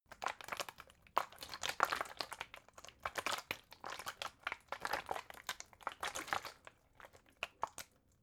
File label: bottle water big handling; gastronomie; berufe